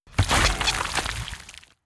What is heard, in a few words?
Kraken stab hard